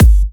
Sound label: 128BPM Kick; Vengeance Samples; VFH1 128BPM Dogde Kit